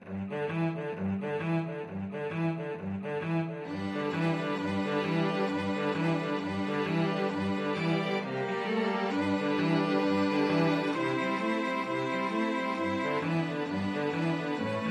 STRINGS; string quartet

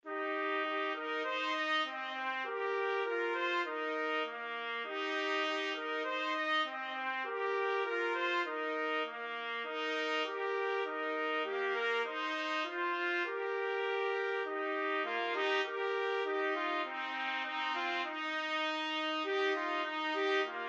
jasmine flower 2 trumpet; trumpet duet

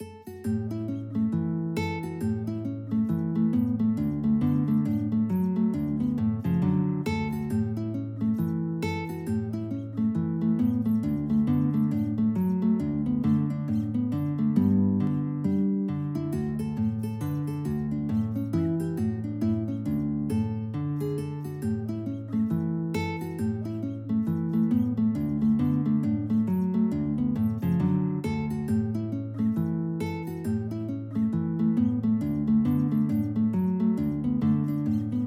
Rag, guitar; Pig Ankle

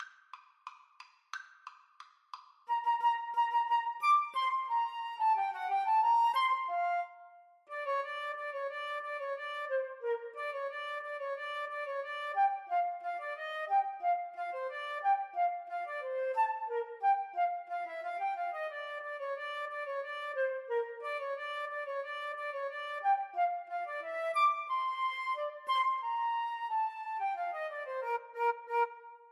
Flute duet

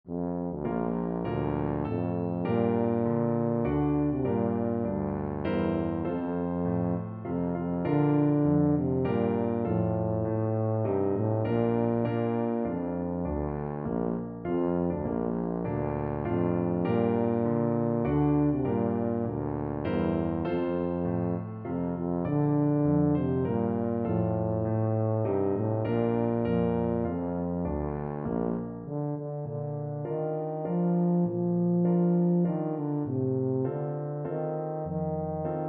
star spangled banner, tuba

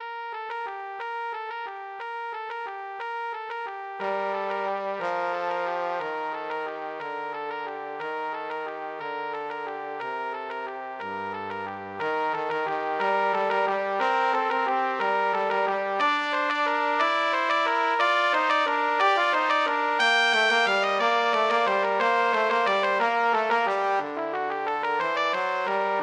trumpet trombone duet

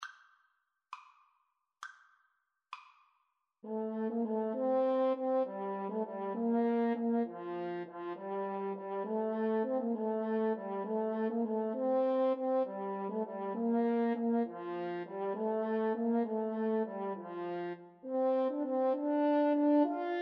mozart sonata331 HN, French Horn Duet

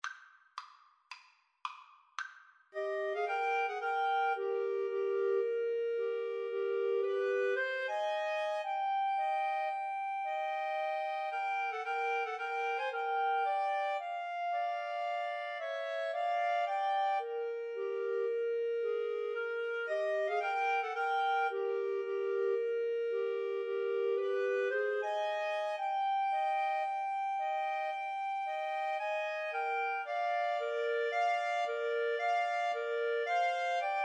Clarinet Trio

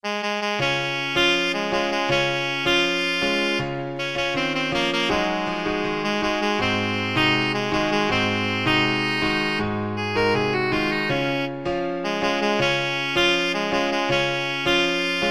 saxophone; la cucaracha

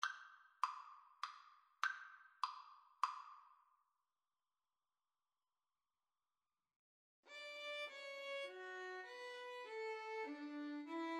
swan, Violin Cello Duet; saint saens

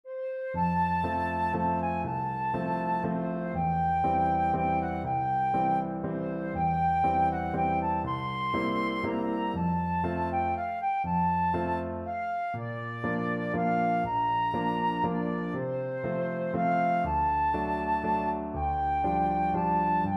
flute

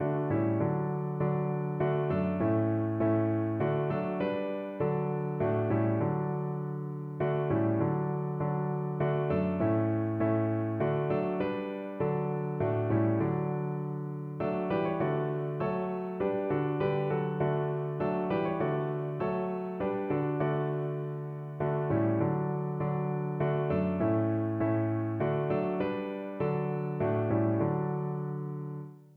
piano

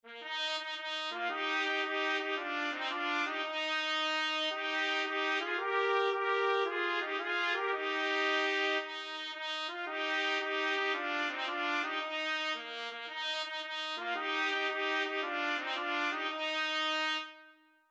itsy bitsy 2 trumpet; trumpet duet

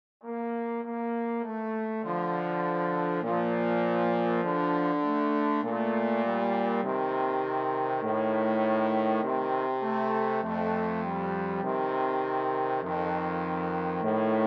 o sole mio trombone duet

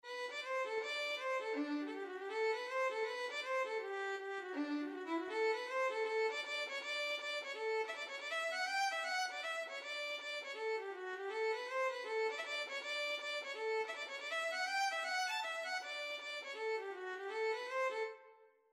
over the hills violin

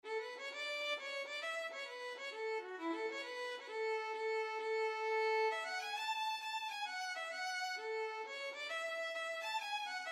violin

